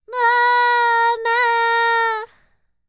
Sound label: World Voices, INDIA; ILIO; Vocal Planet